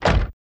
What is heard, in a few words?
Car Door Close